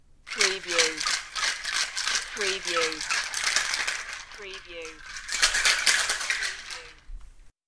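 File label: Ice Shaker Double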